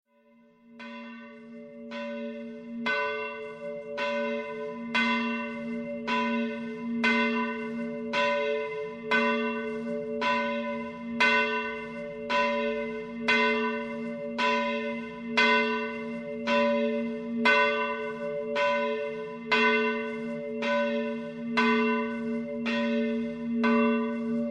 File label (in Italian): campane; GEMONA San ANTONIO